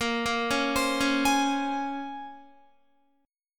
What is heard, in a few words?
Bb Minor 9th Bb, Guitar; Bbm9 arpeggiated